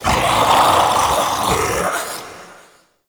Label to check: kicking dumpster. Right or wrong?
Wrong